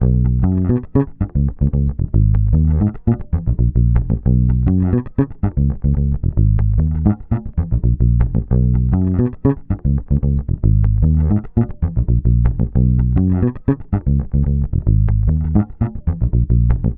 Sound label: Bass loop 113 F#, Electric Bass Loops Direct; Stonefish; Slate Digital BASSHOLE Sample Pack